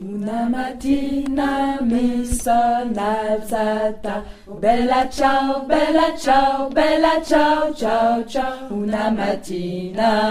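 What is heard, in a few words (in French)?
bella ciao voix basse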